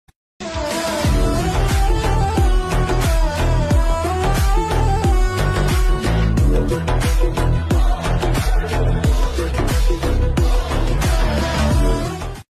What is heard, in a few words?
Song instrumental Bgm Ringtone; Devara